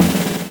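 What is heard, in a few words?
drum roll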